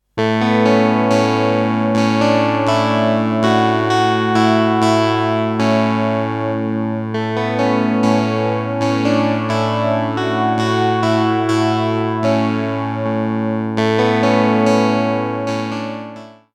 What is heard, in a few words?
subtle chorus